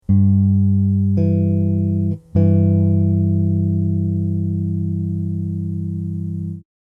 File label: Major Sixth, bass; chords and double stops on bass